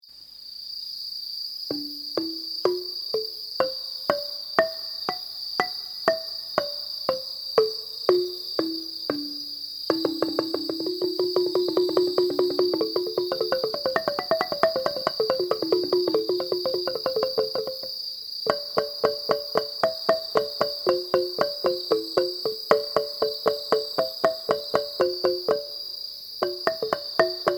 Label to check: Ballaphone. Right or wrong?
Right